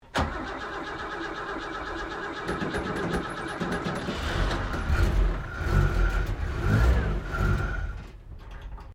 Car Holden HD Premier 1965 ignition stall, classic Australian cars; Vehicles